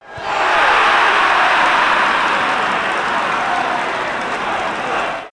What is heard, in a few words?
big aah